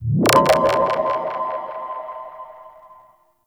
Ambush Trap Pulse; Missions; Venus

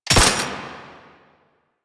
Belt Fed Rifle Low Clip; Weapons; Warframe; Tenno; Frumentarius

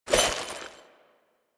Fishing Spear Bait Throw; Warframe; Weapons; Eidolon Fishing Spears

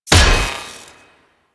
Spear Throw Impact; Warframe; Weapons; Corpus; Spear Gun

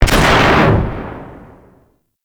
Space Pod Rocket Explo B, Vehicles; Grineer; Warframe

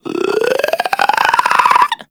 Infested Runner Chasing; Warframe; Runners

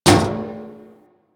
Physics Barrel Impact Medium, Metal Barrel; Physics Objects

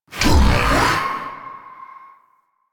Edge Cast; Warframe; Pacifist

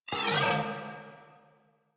Moa Vocal Pain, Enemies; Warframe

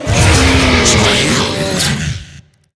Death; Hard Drive

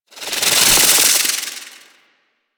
Slinky Movement Rattle; Warframe; Man In The Wall

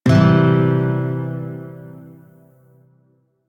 Shawzin Phrygian Chord A; Music; Warframe

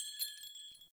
Foley Arm Bells; Jotar; Friendly

